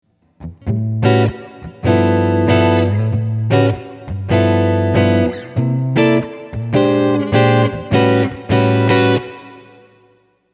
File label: plate; BigSky; reverb; Jazzbox